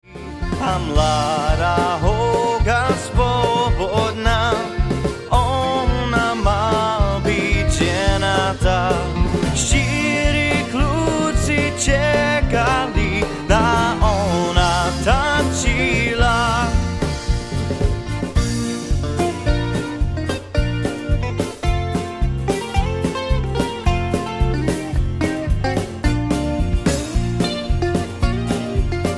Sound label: Polka